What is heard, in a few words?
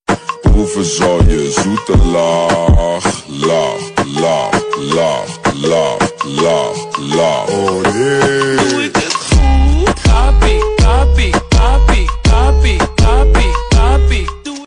Tik Tok viral rap